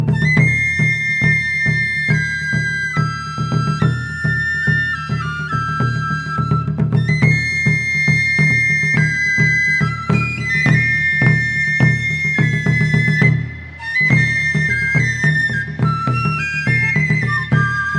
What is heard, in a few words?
drum flute japan